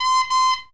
Horn; Pogoda 1.61

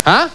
Huh?; Alley 19 v1.11

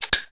option click

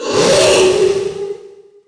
shark hit; DAoC; Toa; Gamestar

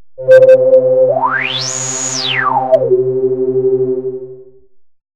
juno, Synth

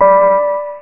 Match

